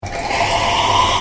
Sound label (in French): Machine à café; bruitage; Electro-menager